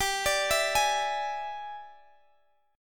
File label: E 7th G, guitar; E7/G; arpeggiated